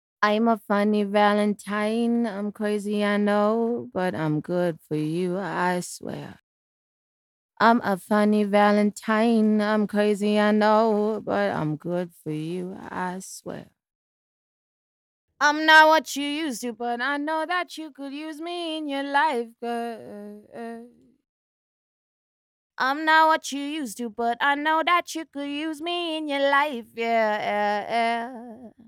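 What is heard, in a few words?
Synth Pop 130 G sharp M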